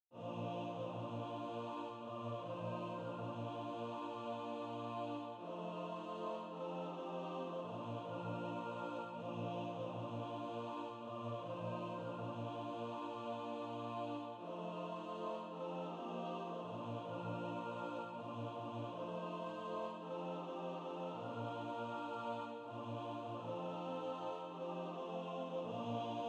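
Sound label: bass, Practice Music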